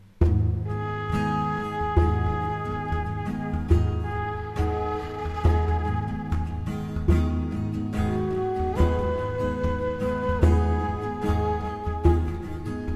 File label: raining dance; Ethnic